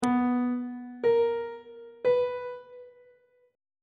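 c flat diminished 8th perfect 8th intervals on treble clef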